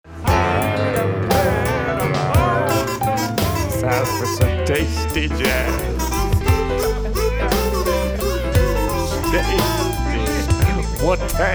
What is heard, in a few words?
Tasty Jazz